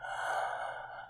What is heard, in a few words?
Sleepy breath